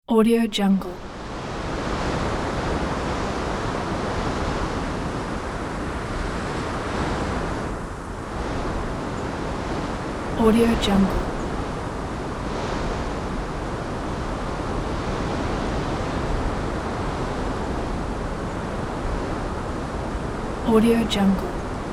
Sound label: in mountains